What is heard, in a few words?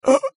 death